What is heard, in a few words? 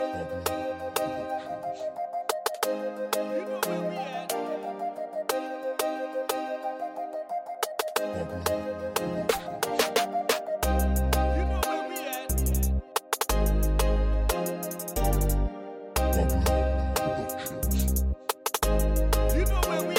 Reggae Dancehall